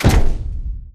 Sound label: footstep generic close; Titanfall 2